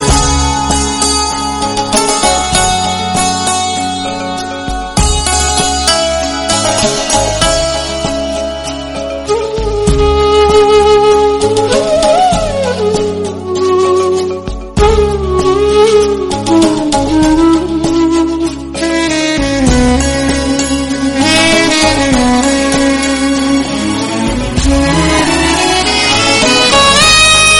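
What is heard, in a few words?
instrumental background music; aashiqui 2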